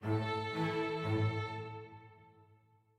happy med end

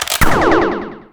Boss Attack

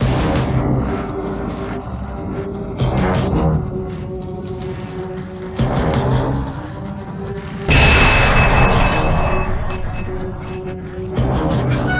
Oilbath; Sakigake Otokojuku Nihon yo Kore ga Otoko de Aru; PS3 gamerip 2014

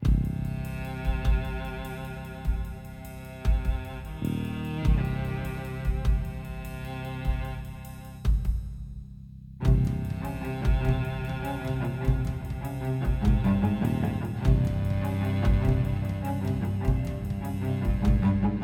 Action Desperate Escape 100bpm Low; project zomboid

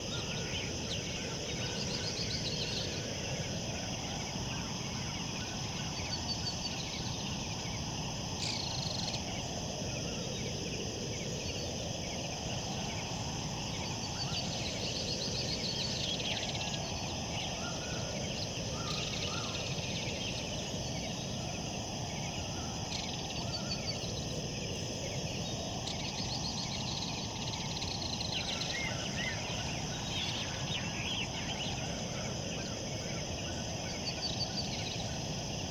Ambience Plains Loop

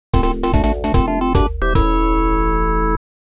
Round Start

